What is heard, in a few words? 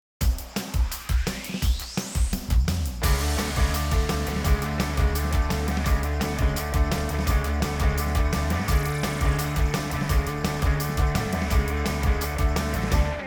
inst